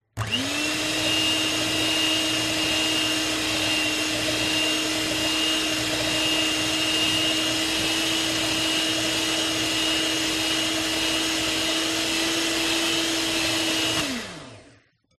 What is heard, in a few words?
electric whisk mixing